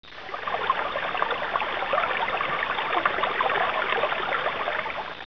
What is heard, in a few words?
stream